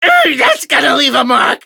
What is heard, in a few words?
pain; Snork Gunk